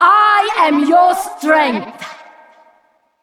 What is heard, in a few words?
Female Valkyrie Attack